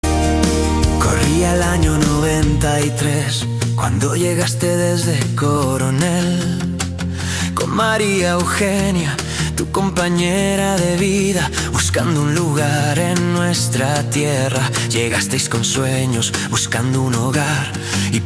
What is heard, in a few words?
pop rock; feliz jubilacion